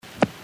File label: transient